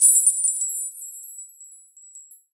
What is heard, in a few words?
bells, bowls; Best Service ProSamples vol.52 - World Instruments 2; AKAI